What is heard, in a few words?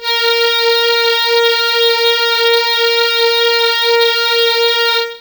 Matrix Strings A#3; Pro Sounds Sample Collection